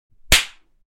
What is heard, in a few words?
loud slap